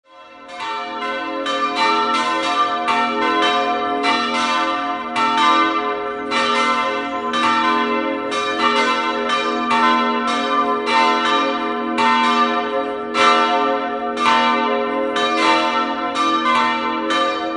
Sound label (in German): Steinsdorf, glocken